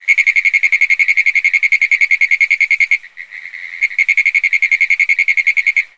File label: Frog Pinewood Tree